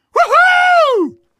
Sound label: dog cheers; Portal 2; Source Engine; video games; dogfight